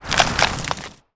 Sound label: Flame Thrower Skin, Damage